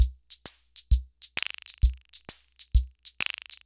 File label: Loop, sr-95; classic machines